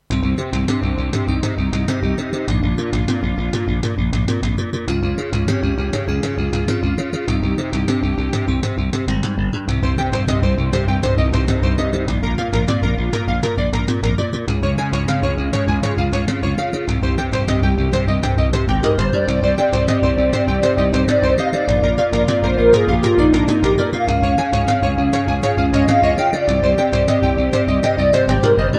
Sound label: Roland SCB-7; Introduction; Hocus Pocus